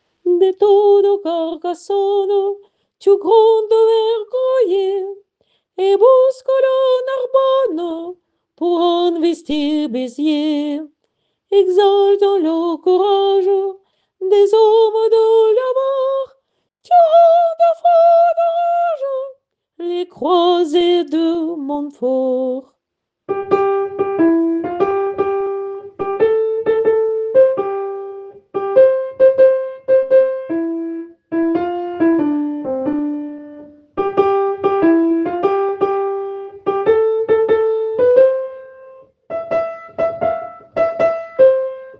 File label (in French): couplet Soprano